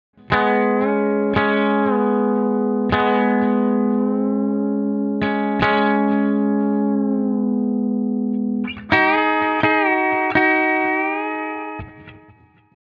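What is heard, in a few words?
diatonic bender pedal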